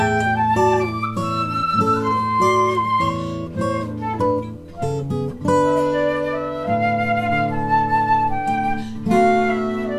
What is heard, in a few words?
acoustic guitar